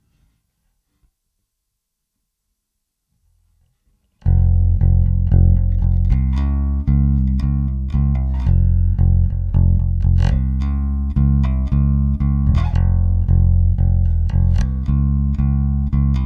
EBass DI

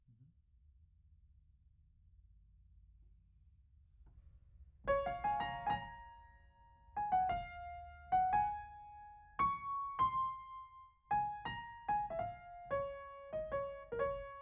Piano Mono

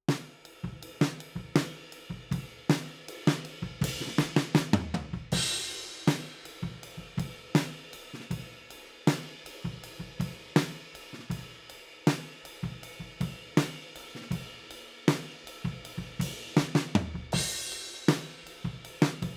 Overheads, 160 BPM

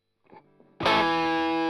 Strum Line

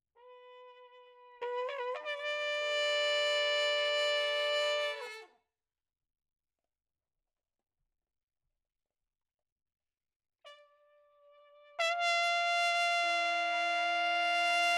Trumpet

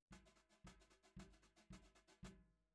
SNR Bot

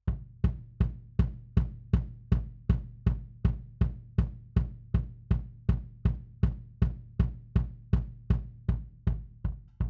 Kick Out, 160 BPM

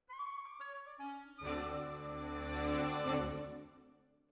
Decca, Jazz for piano

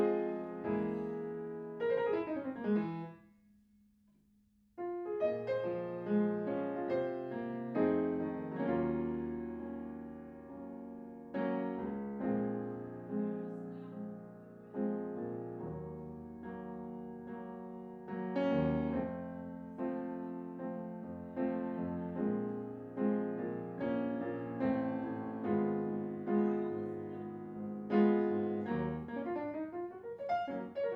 Piano Room

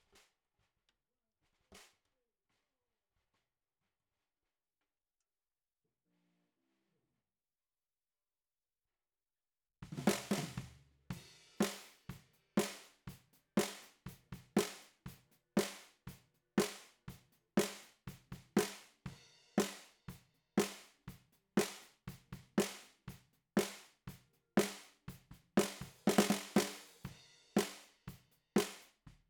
SNR BOT

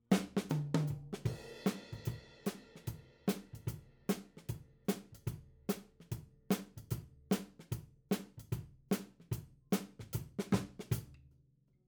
Ragtime

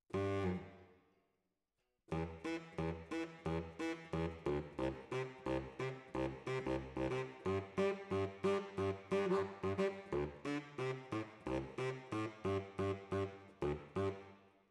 Tenor, RNR for piano

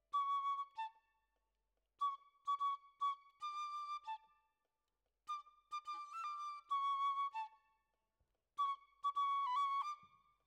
Flute

